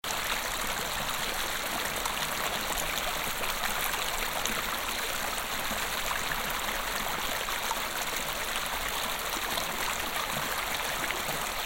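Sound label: river mouth water flows in river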